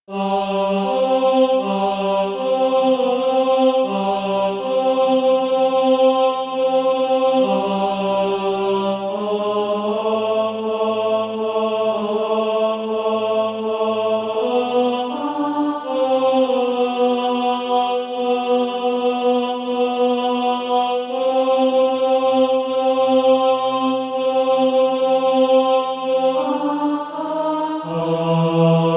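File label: tenor, SATB Practise Music; Crosby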